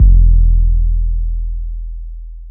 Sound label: E, 808